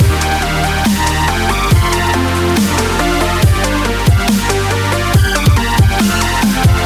loop

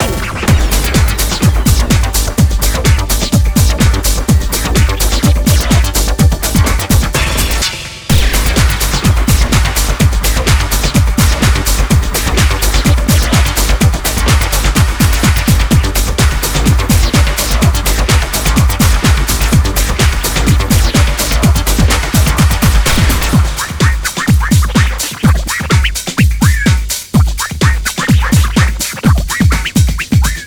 common menu music (loop); itg

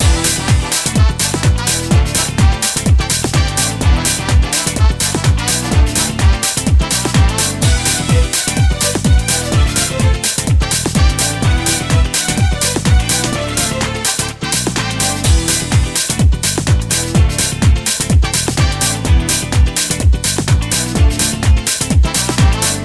music loop

